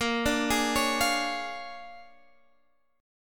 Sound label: A sharp 7th Sharp 9th, Guitar, Standard; A#7#9 arpeggiated; JGuitar